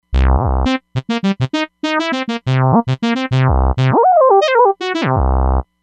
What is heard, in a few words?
Jucy Saw, Roland Fantom XR